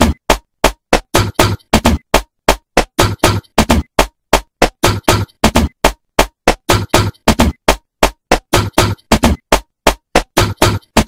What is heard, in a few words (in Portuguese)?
BEAT